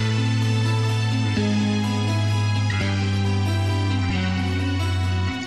guitar, Sad

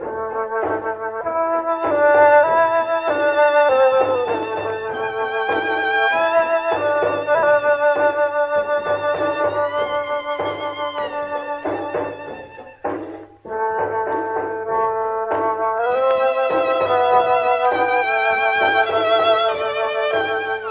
flute; Phonky

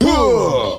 huh

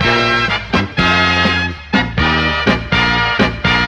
horns, phonky loops

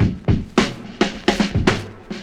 Drum loops, Funky

